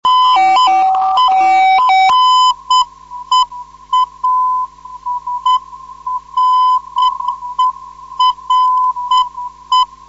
7038.9 kHz

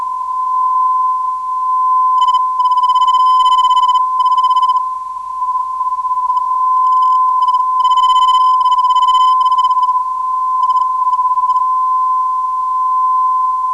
385kHz, NDB

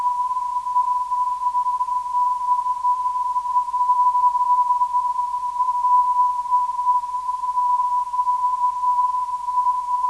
347kHz, ndb; DBR

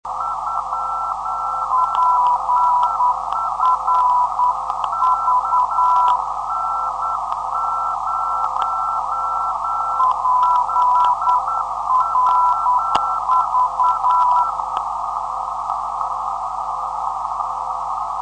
476.5khz, ndb

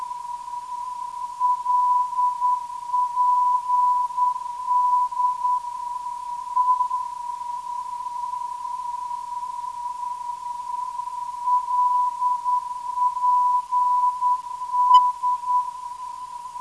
LPD 373kHz

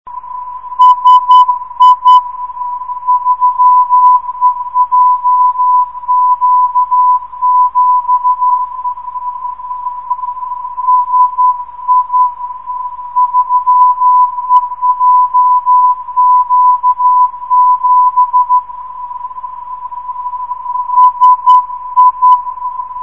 410.4kHz, ndb

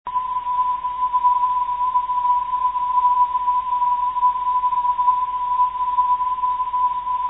340khz, ndb

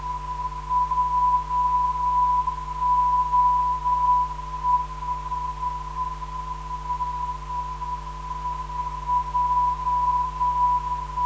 325kHz, NDB; JOE

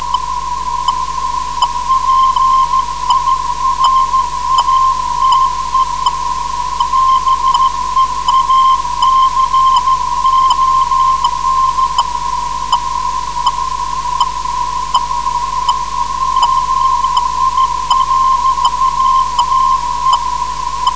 pirate 3579khz

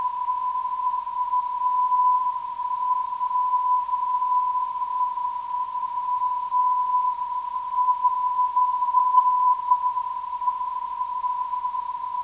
289kHz, ndb

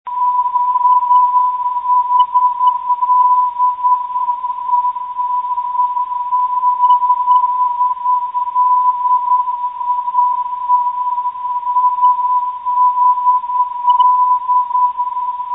shd 383khz, NDB

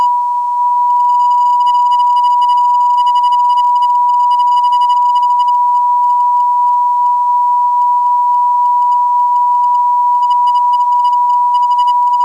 417kHz, NDB; HDL

